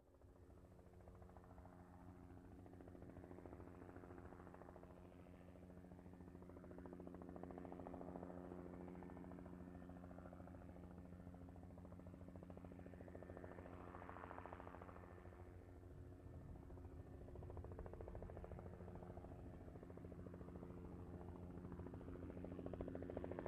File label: Helicopter slow fly over